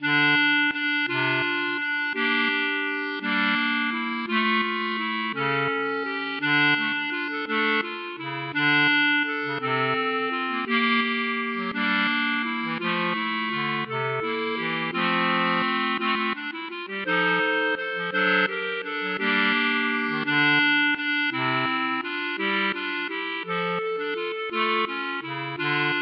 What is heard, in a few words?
klezmer